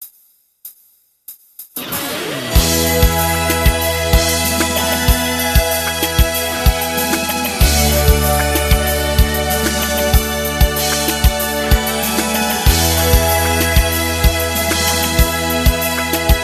abertura

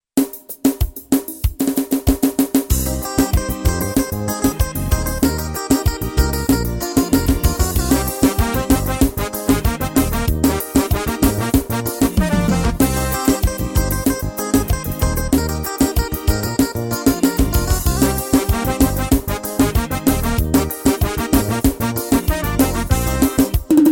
ritmos

